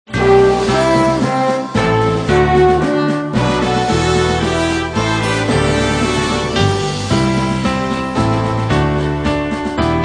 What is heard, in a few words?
Instrumental Hymns